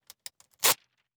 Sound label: credit card paper tear